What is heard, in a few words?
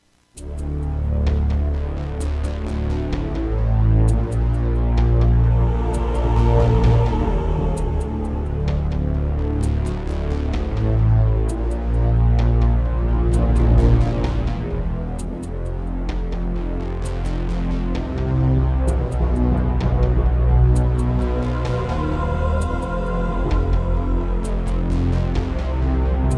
Roland SC-8850; Descent II